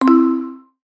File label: Mass Selection